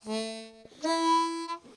harp; Verdigris Music

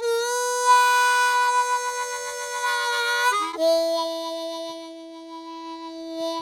harp; Verdigris Music

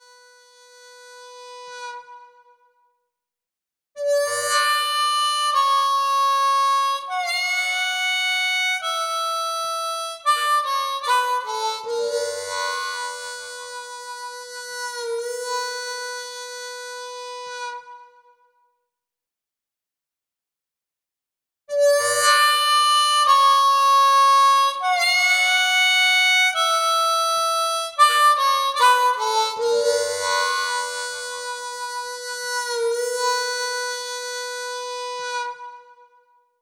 Harp; Verdigris Music

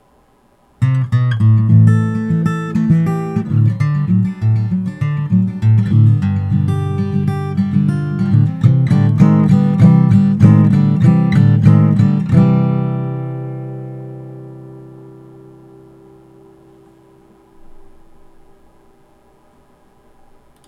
Instrumental Outro; REVEAL NEWS

